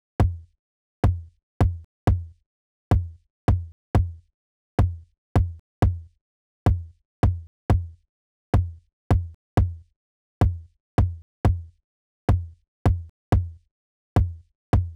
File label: tom, Key D#, Bpm 128, Big Room House